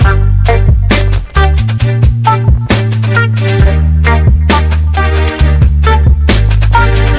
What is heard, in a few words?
loop